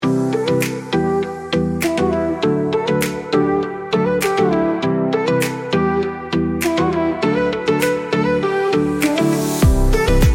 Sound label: Pop